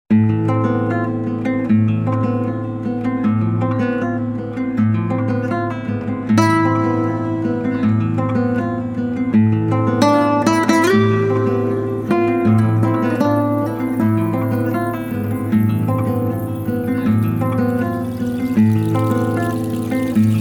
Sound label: Prelude